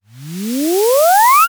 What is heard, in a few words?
riser digital, transitions